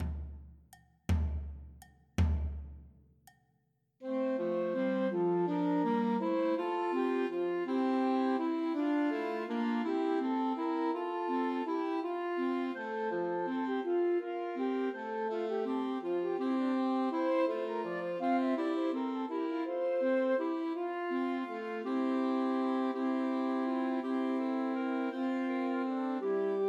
Sussex Carol Christmas Trios for Flute Clarinet and Alto Saxophone